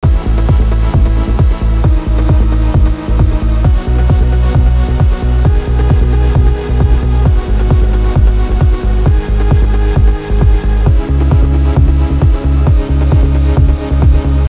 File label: music